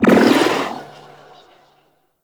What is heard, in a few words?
Puzzle Orb Spawn; Warframe; Duviri Murmur; Medusa Puzzle